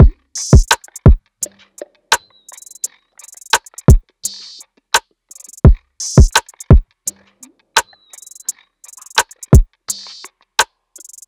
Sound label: Drum Loop 85 BPM; Healing; Cymatics; Vibes II Drum Loop Collection